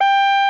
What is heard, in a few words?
emergency